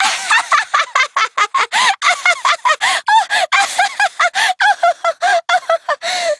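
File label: battle laugh; dqxi; veronica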